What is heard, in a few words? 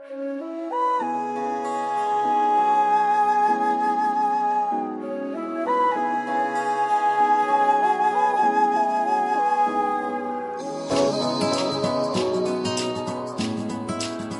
Instumental